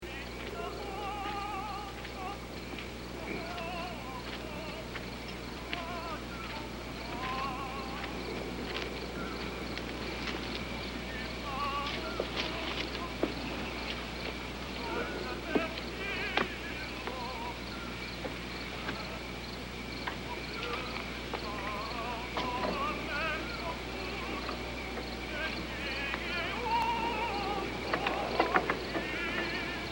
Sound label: Victory